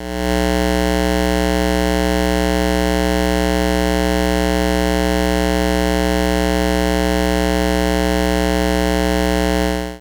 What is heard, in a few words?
61Hz 10 percent pulse